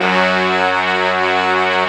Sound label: SYM BR.E F#3, Korg; Back In Time Records; Korg Universe VOL-1; Korg SYMPHONY2